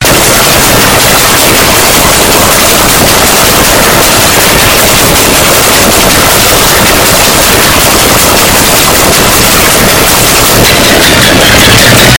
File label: running